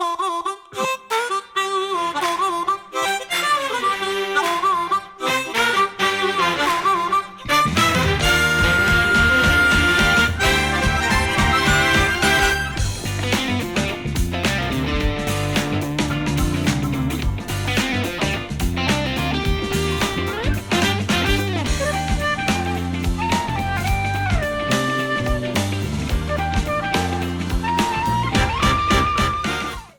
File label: Staff Roll; Mario Kart World